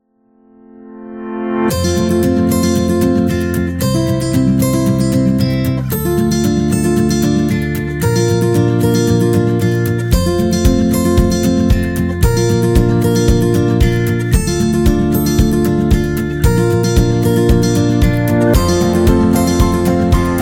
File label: Guitar Inspired